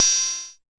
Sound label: Jomox MBrane, drum machines; Jomox MBrane 11